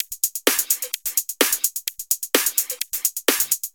Houseloops 128BPM; Vengeance Samples

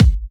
Kicks; Vengeance Samples

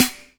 Snares; Vengeance Samples; VEH2

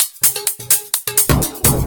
Electro Loop 128BPM; Vengeance Samples

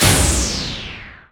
Impact; Vengeance essential club sounds-3

